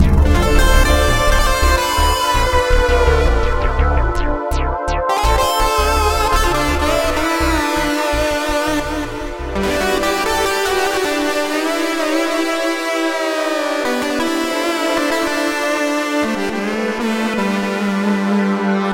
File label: Leadsynth Pitch Bend Foot Control Mod Wheel